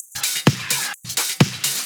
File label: House loops 128BPM; Vengeance Samples